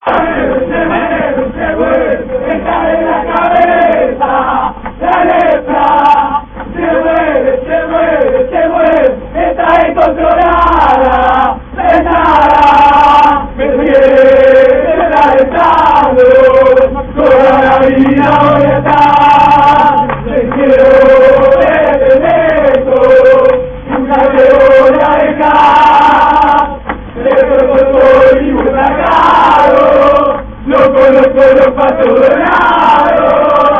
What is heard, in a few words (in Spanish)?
cancion; newells